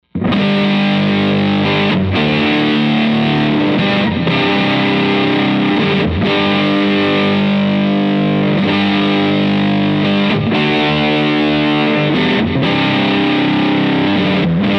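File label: prs both dirt rhythm; prs22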